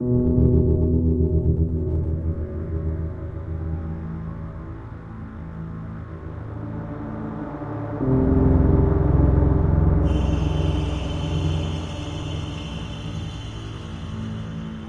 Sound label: Future World Darkness Loop; Eternal Edge